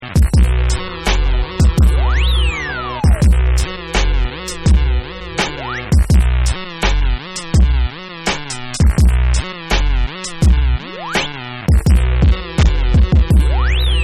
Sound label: Instrumental